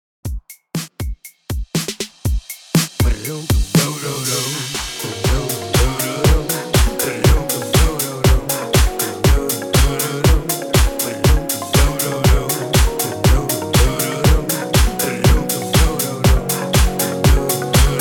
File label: HOUSE MIX 120 BPM